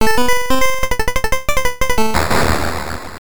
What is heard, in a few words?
Game Start ~ Level Complete; Pirate Pete